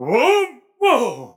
Grunt Jump; paladins; BombKing

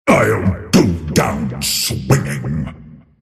attack pain; Earthshaker; arcana

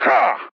Grunt Jump; Paladins; Viktor

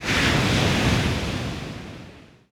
effect water breath; feheroes

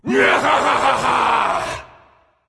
Laugh Fire

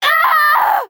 Falling; Kinessa

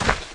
footstep; Dota 2; Mirana

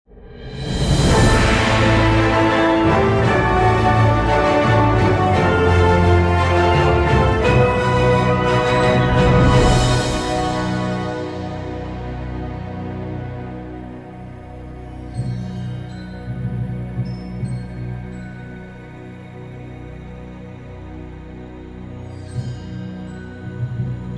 radiant win

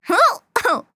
Grunt Jump; Paladins; Rider

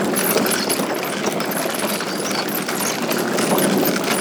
idle loop; Techies

